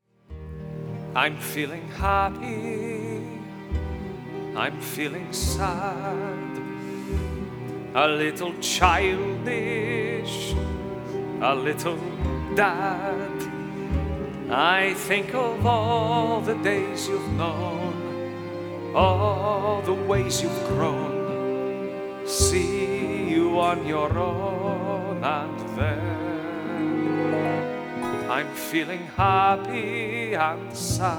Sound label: happy sad